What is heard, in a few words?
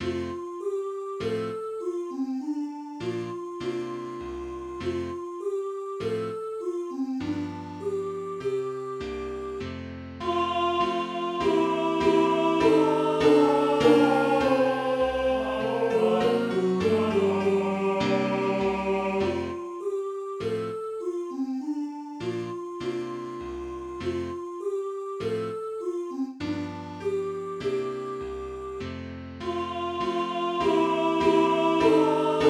bass, practice music